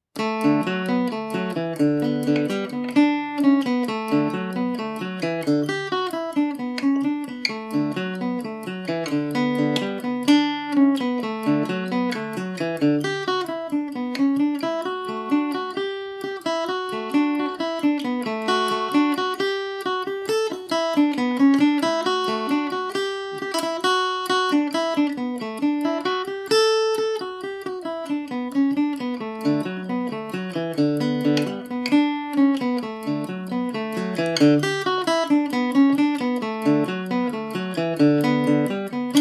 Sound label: Irish mandolin; wind that shakes the barley; late night